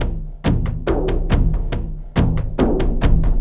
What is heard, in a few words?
Doonk Dooga Woopa Waka, Frame Kendang drums; Sonic Foundry (Sony Creative Software) - Friendly Fire; Long